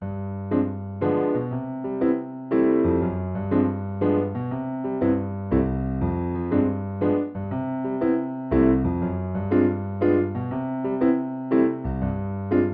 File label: piano